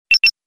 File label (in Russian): zvuk elektronnoy pochty korotkiy zvuk email